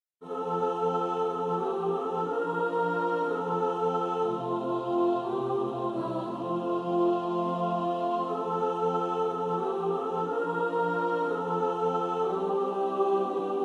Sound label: mixed